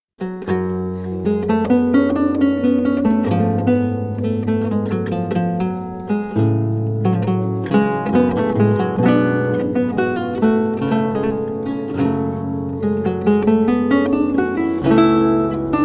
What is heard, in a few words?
Lute Suite in G Minor, Courante; BWV 995 - III.; Bach; JS Bach - Complete Music for Lute